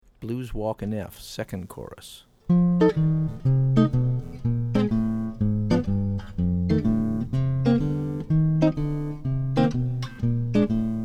In F, 2nd Chorus, jazz chording

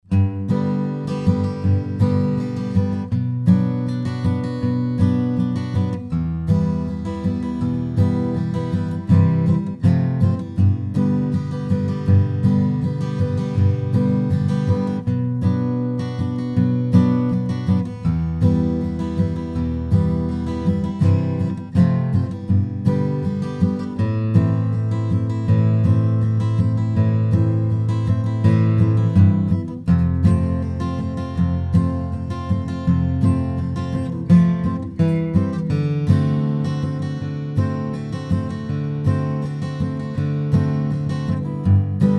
key of G, strum style

